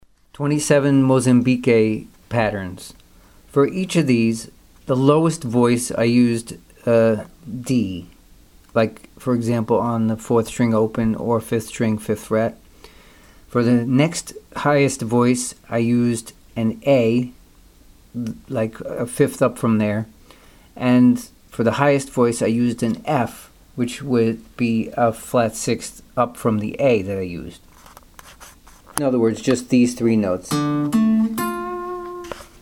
mozambique patterns

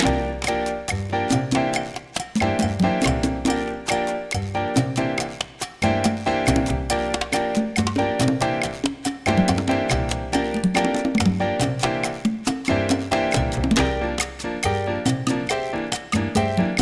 cha cha cha

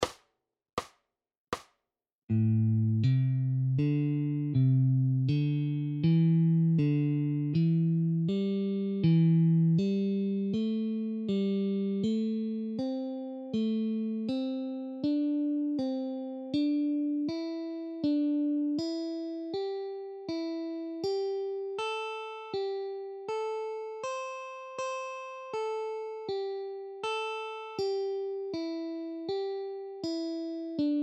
alternate picking at 40 bpm

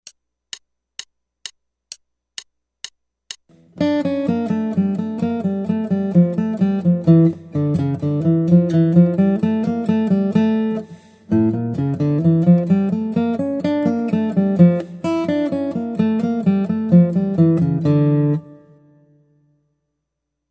Guitar

